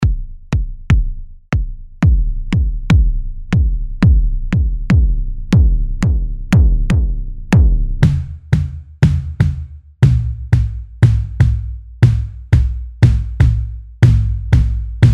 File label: Solo Kick Module; MASCHINE 2.0